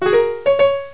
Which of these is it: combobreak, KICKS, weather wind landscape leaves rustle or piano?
piano